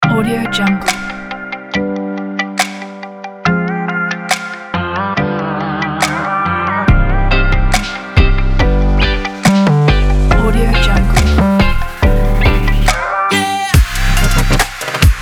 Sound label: chill trap